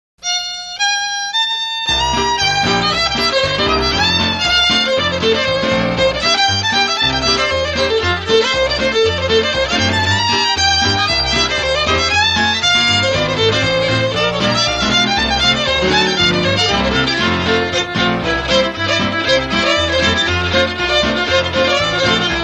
beaumont rag (instrumental)

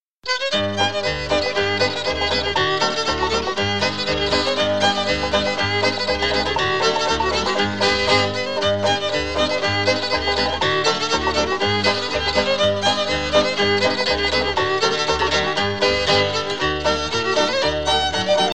polka (instrumental)

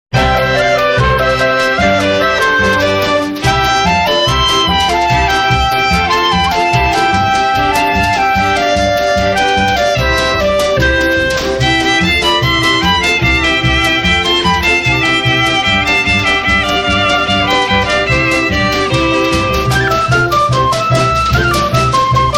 savila se bela loza (instrumental)

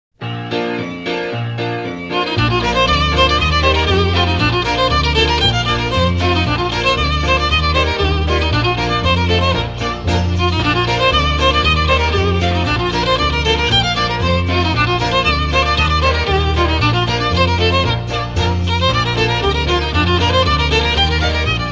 mountain ranger-old joe-red wing (instrumental)

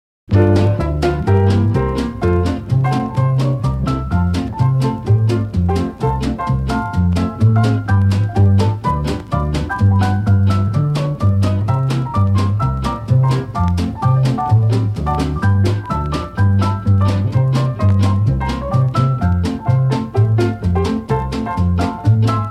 instrumental

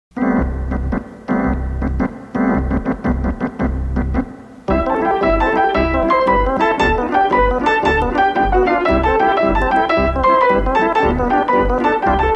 loomis lancers part 3 (instrumental)

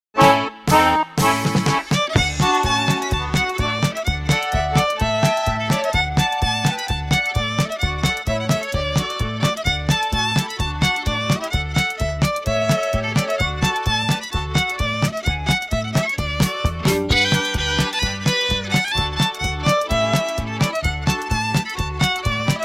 instrumental

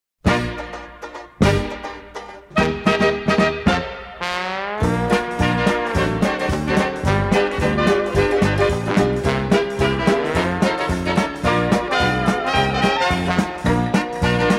mixer, instrumental

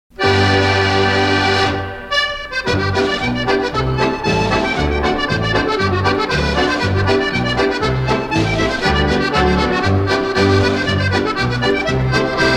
hornpipe (instrumental)

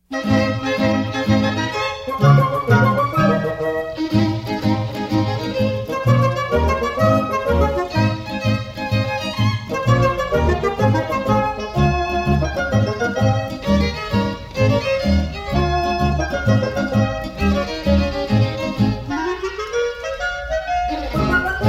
jefferson's reel (instrumental)

